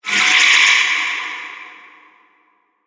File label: Ice Shield Spell; Magic Sound Effects; Fantasy Sound Effect; Andrew Fleming